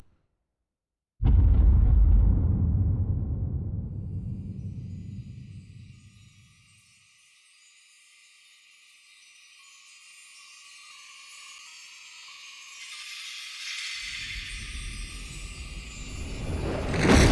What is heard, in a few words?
Vision SFX; Bromius; Synod